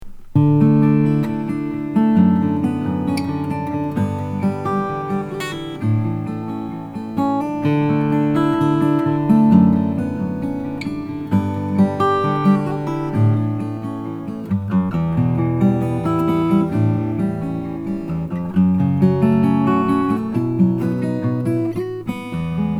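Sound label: strahm 00 cutaway brazilian rosewood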